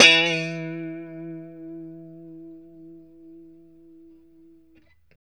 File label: POP E 3, STRAT guitar; Spectrasonics; Hans Zimmer Guitars VOL-1; JC-STRAT POP